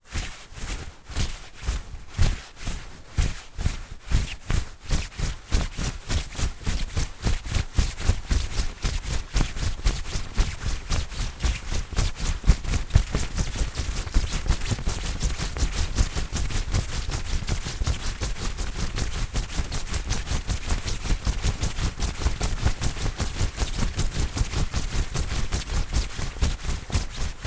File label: foley clothing shorts sports movement walking into jogging into sprinting and back down to